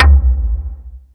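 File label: mid tom, drumtraks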